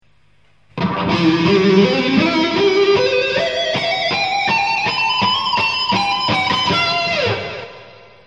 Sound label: solo revelation